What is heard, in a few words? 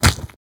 run gravel